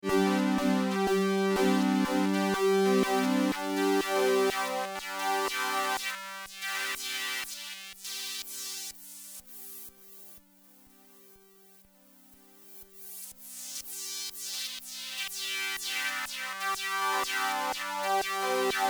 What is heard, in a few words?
harmony HP; tellun